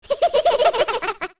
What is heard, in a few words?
Ocarina Laugh; Skull Kid laughing after playing the Ocarina